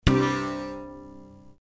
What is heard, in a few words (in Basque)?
Ttun ttun kolpea LA